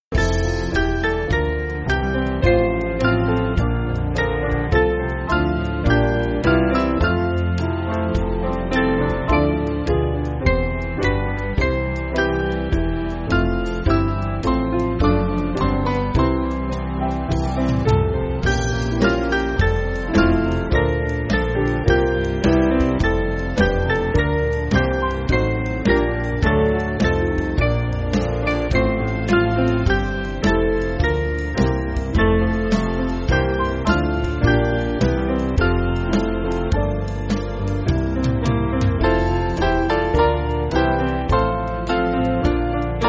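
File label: Band, Backing Files for Churches; When Wilt Thou Save; Kendal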